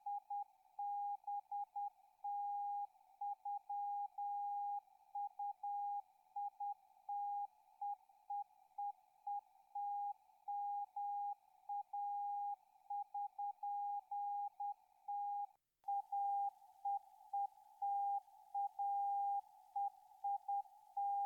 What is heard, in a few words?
G33DDC 28257khz; DK0TEN